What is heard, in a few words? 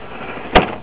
door slam